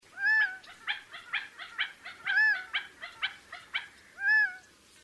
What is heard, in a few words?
Gambels Quail, songs